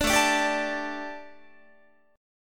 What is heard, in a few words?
A 7th Suspended 4th, A, down, Guitar